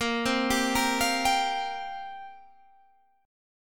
C 7th Suspended 4th

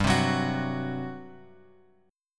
G Major 7th Suspended 4th G; GM7sus4 Chord {3 3 4 X X 2} strummed

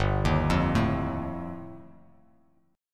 G 7th Suspended 2nd Sharp 5th G, Bass Standard; G7sus2#5 arpeggiated

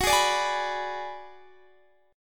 Ab Minor 7th Flat 5th Gb

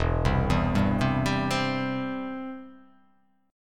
E Minor Sharp 5th E down

